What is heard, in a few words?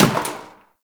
Destructible Bucket Impact; Warframe; Ambience; NineteenNinetyNine